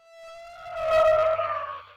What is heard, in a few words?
wail long; wesnoth